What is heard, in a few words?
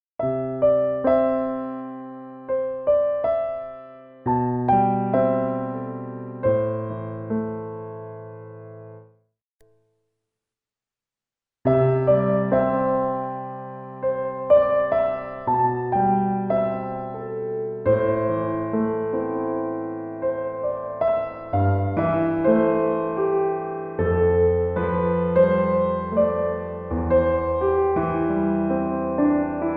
REVERB FACTORY